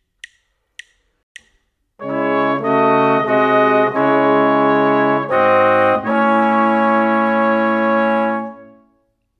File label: harmonic dictation